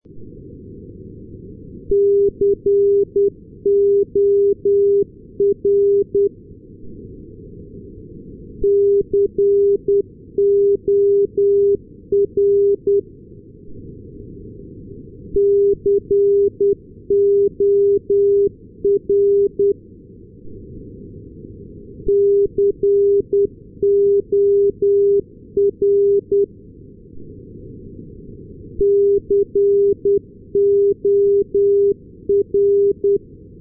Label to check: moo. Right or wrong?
Wrong